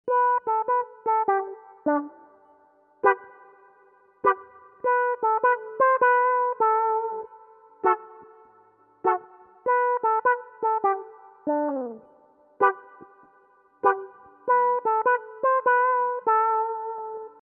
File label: Q-Tron